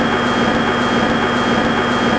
freight lift move

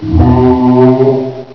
scary

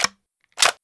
FG42 Reload

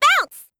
bounce

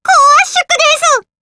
reloading

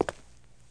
ninja step

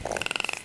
ant idle

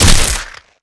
slash; Crimson Head; zombie

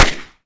g11 fire

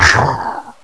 pain; baby

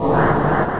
charged, weapons

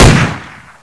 pistol; Doom

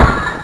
fusion exploding, weapons

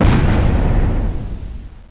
distant mortar

